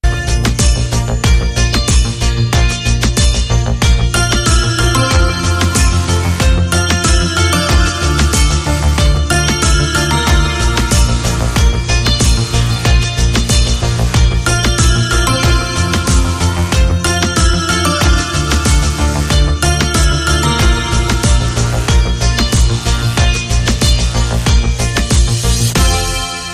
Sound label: Reggaeton Rhythm